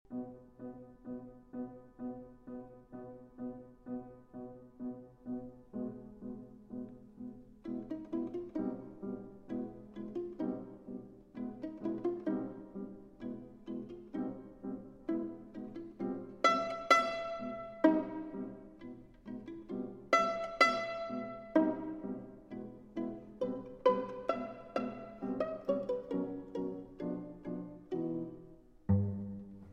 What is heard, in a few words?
Piano Trio in E Minor, Allegretto - Adagio